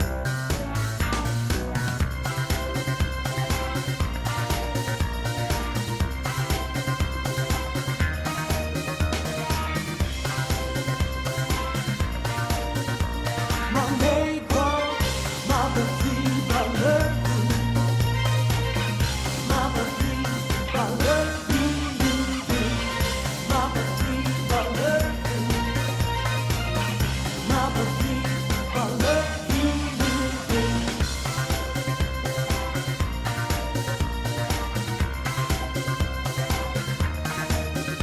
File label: Karaoke Disco; The Sims 4 City Living; macOS; PS4; Windows; Xbox One; Xbox Series X/S